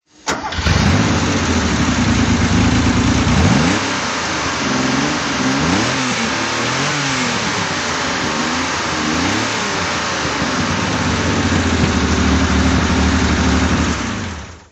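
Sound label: porsche 911 24t injection throttle; Porsche 911 2.4 T Targa, 1973; Engine sound; V3D/ ClassicarImages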